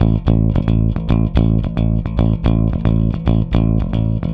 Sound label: Rock, Bass; Bass X